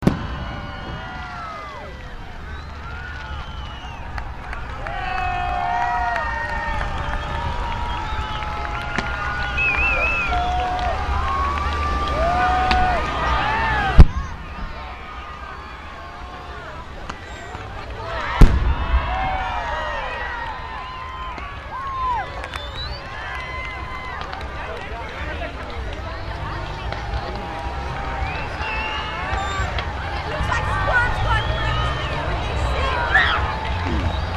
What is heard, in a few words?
fireworks crowd, binaural recordings; skycastle